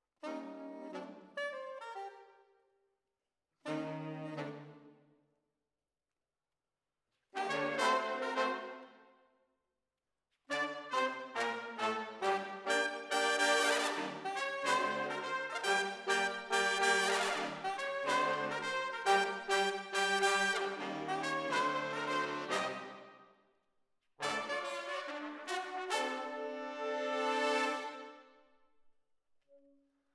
ORTF, Jazz for piano

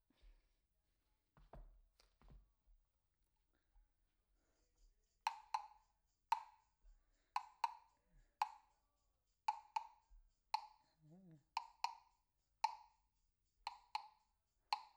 PERC DYN

